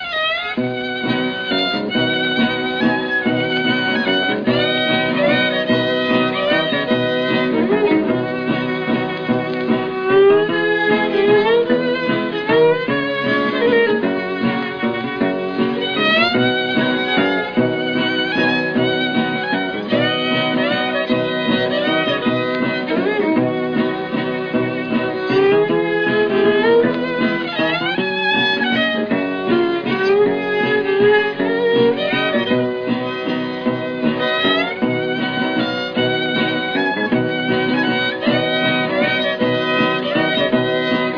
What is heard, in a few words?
Waltz